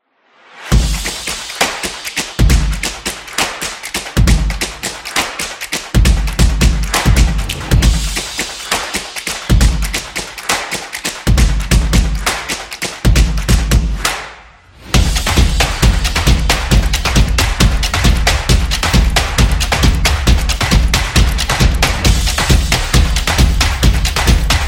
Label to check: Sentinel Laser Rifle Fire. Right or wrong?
Wrong